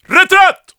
retreat

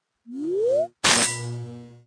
Emote Idea

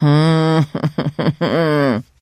sigh; 商人杰恩（七日杀）

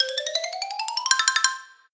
teleport init

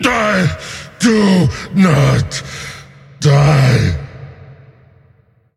death; Volibear

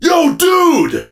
hurt

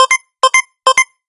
Bomb bleeps

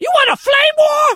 crow hurt; phoenix

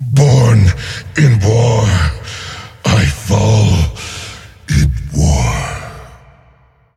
death; Volibear

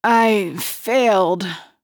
Knight death; 骑士（KRL）

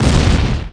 kaboom

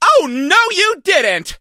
hurt

